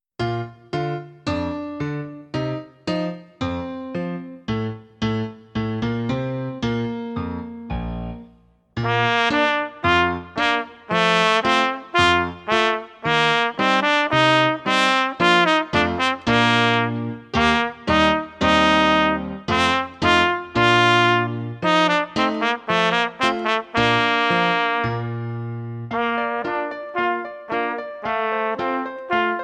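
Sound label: Dance Song - Solo With Accompaniment, trumpet; SOE Festival Solos Book 1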